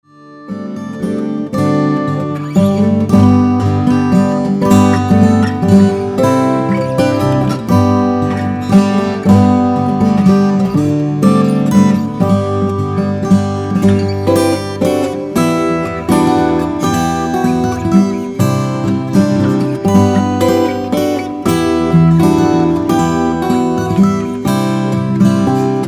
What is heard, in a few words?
Waltz